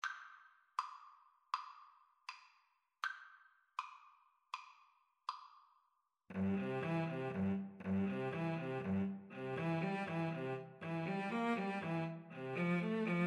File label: violin cello, Violin-Cello Duet; schubert ave maria